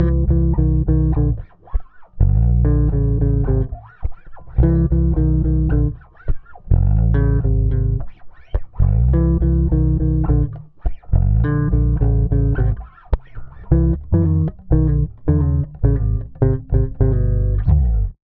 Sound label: 105 Cmaj SUGARFUNK BASS; 105 BPM